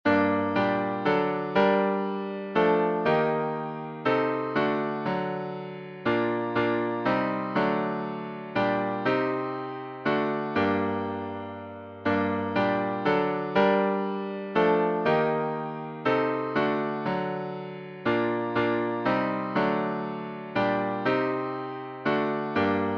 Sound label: Hymns